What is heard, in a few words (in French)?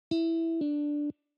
effets de jeu Pull off